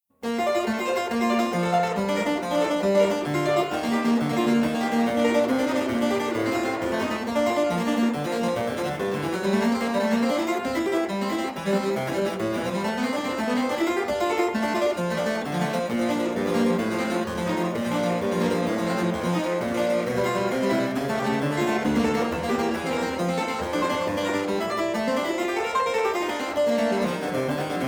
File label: pre Bb, Flemish harpsichord; historical instruments